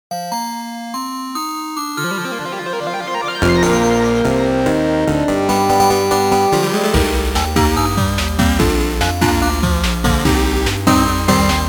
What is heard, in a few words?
intro, 8bit